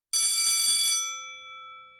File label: ring